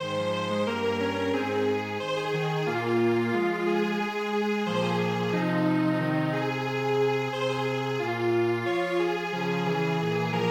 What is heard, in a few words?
Strings Quartet